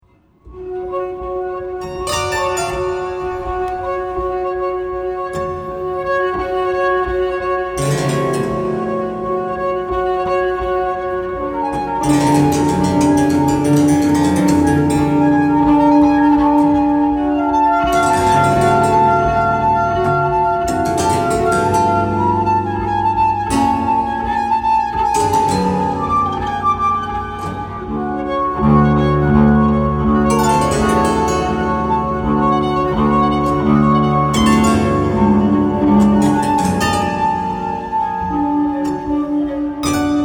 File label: harps